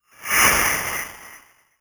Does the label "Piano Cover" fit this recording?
No